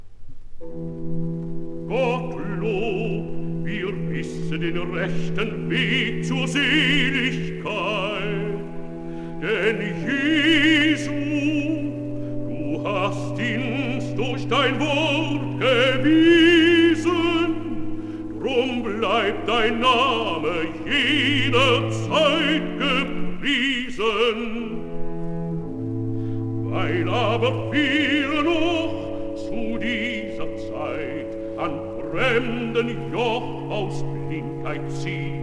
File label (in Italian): recitativo; bach; bwv79